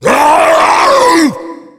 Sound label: tank fire, zombie; Left 4 Fortress